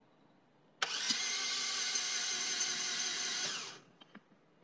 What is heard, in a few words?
starting sound; wont start after cleaning throttle body